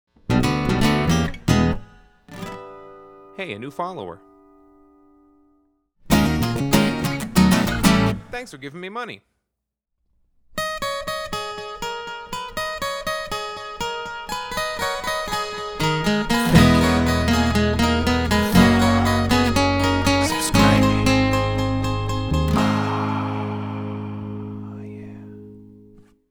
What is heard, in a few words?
all jingles